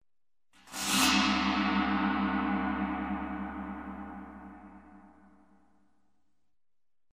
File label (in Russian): bolshoj gong s metallicheskim effektom